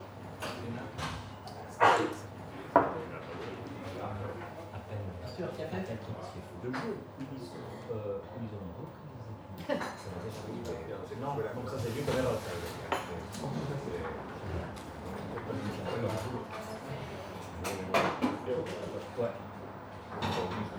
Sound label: Cafeteria Cafe des Artisans Lausanne Very Few People Dishes; Epidemic Sound